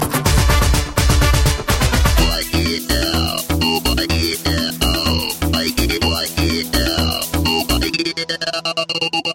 Bolly Wood

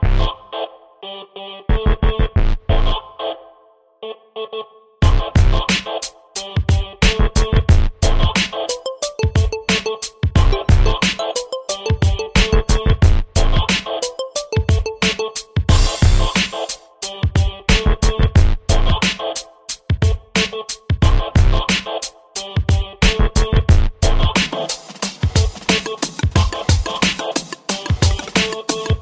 New-age Ringtone

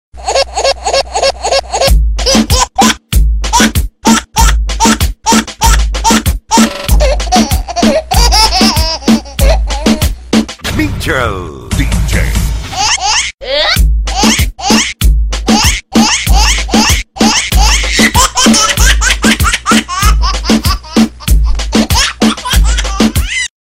Baby Slow Jam Remix

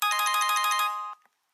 LG Ringtone

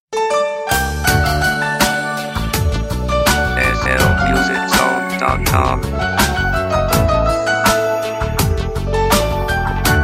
Music Tone

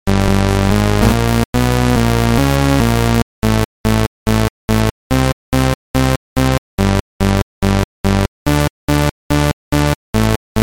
Distorted

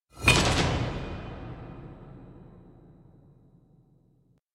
Alert; Smite

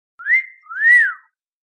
Whistle Sound

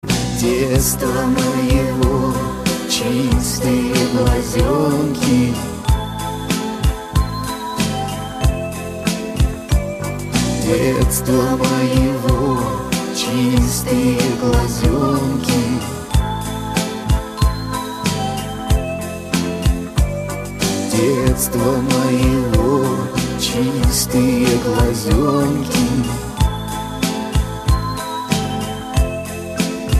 Kids

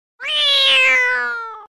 Baby Cat

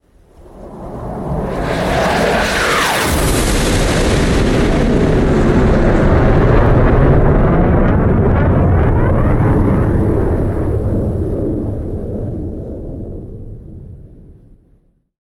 F15 FLYBY

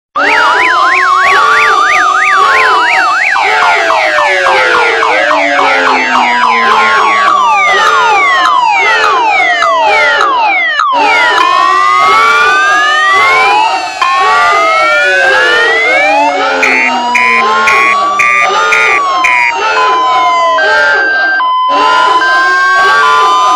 Wake Up Alarm

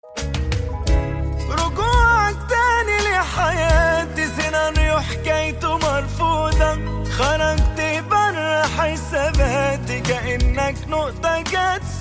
ringtone egypt

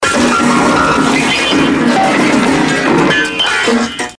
Breaking Piano

